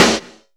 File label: Snares, Real